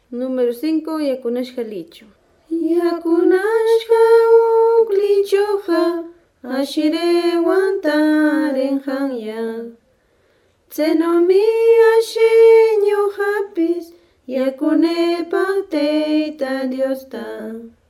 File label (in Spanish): cancion